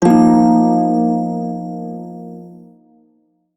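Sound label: Shawzin Pentatonic Minor Chord; Warframe; Prime Shawzin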